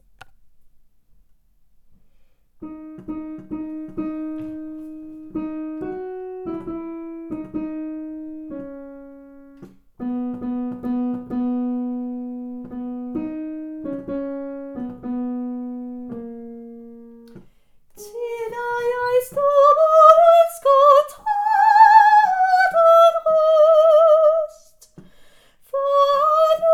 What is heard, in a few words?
SOPRAN; Tit er jeg glad